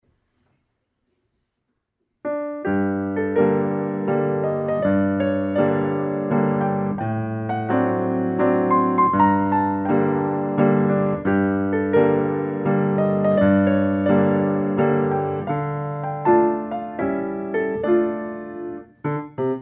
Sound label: Mazurka Hauskonzert